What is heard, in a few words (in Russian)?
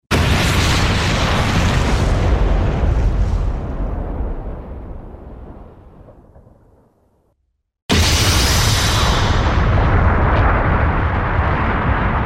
2 moshhnyh; Звук взрыва от бомбы или снаряда